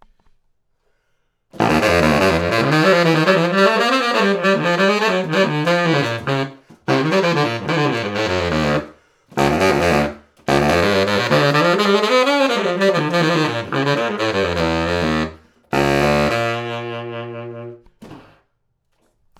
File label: selmer bass